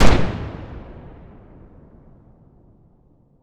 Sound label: cannon fire single shot blast explosion